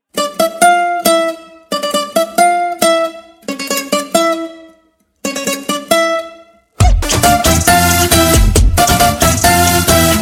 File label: Jingle